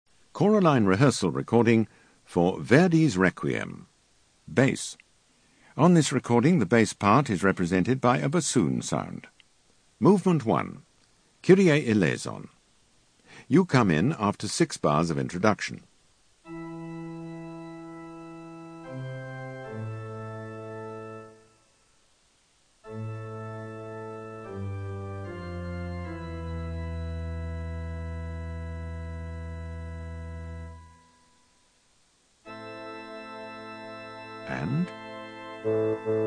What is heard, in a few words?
Bass; Verdi Requiem